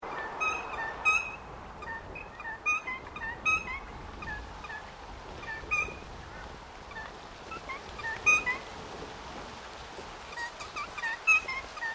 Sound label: Bird Noisy Friar Call, Birds in Queensland; Birds (Sound Effects:Animals)